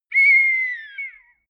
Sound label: whistle police faint